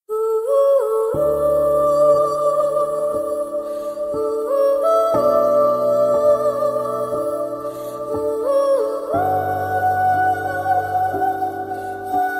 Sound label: telugu love huming high quality ringtone; devadas